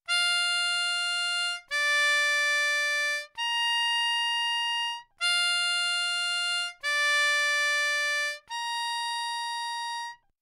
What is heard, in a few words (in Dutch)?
Het geluid van de Hoorn; Muziekreis